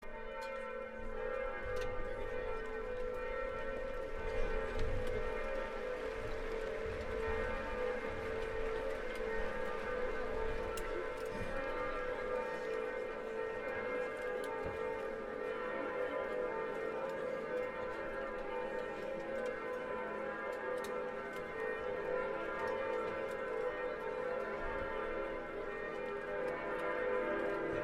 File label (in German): Glockengelaeut